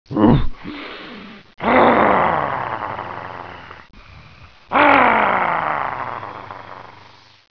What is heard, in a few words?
Grrrrr; T.J. Finds a Friend; Boomer